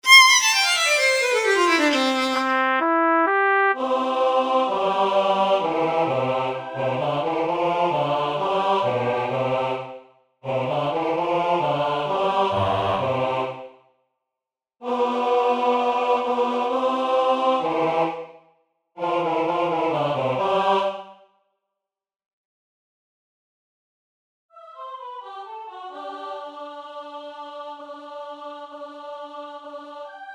Bass; Allegro 2; ReginaCoeli

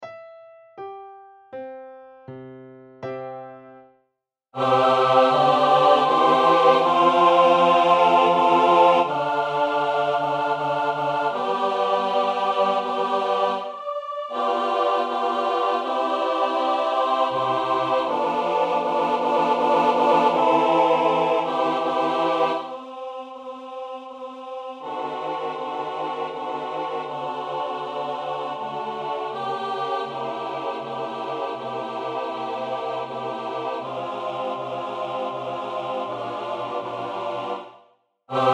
Largo Tutti; Salve Regina

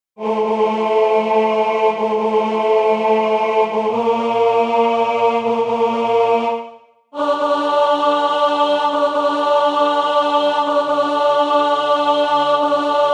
Tenor; LaVergine; Italien

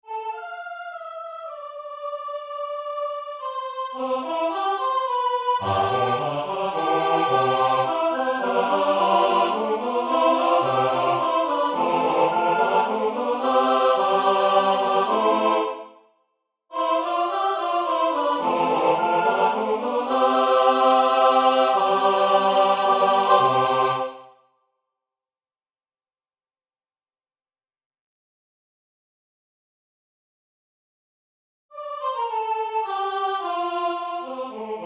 Tutti; Tempo Moderato; ReginaCoeli

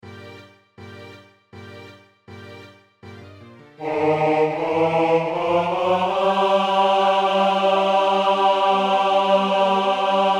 Bass; Requiem

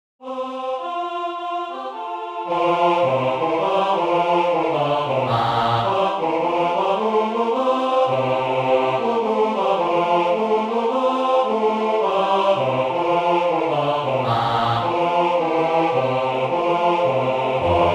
Bass; Swing; Prélude

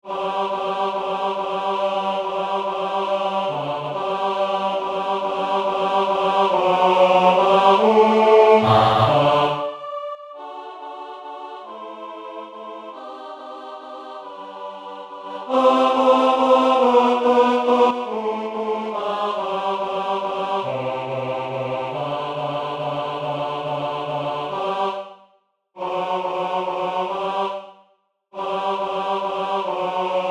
Bass; Ciel; Oper

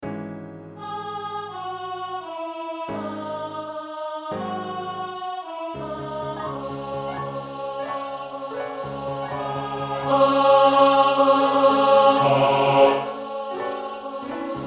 Bass1

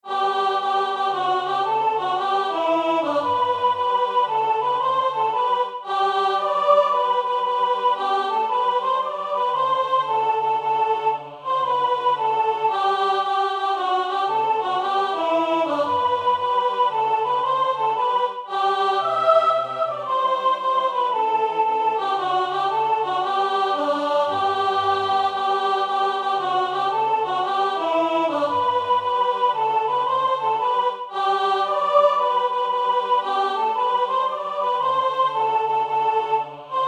Sop, Swing; Polonaise

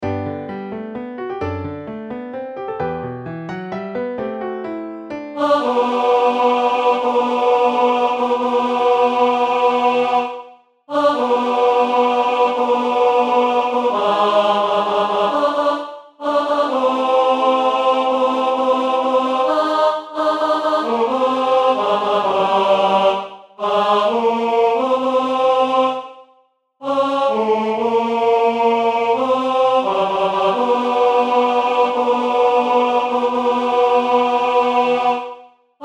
Tenor

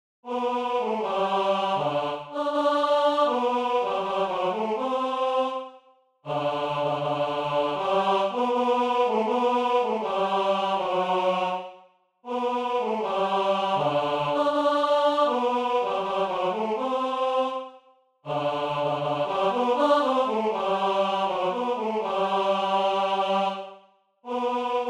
Bass; VaPensiero; Italien

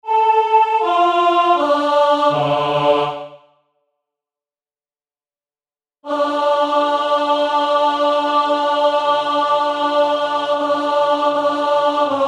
Adagio Tenor; Salve Regina

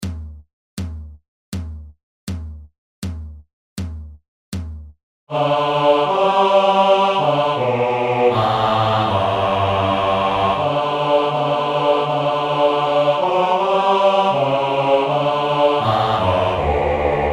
Bass; Fontaunas clar resunan

